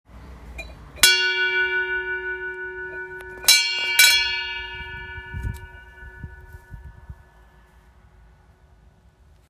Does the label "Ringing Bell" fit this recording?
Yes